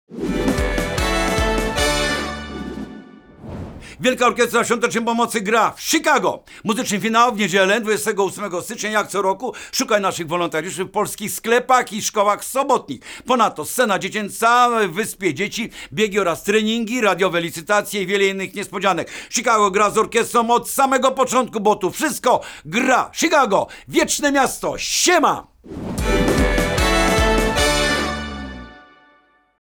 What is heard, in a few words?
Jingiel Chicago